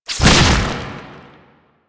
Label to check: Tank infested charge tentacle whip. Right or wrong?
Right